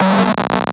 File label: 8Bit Fx; Antidote Advent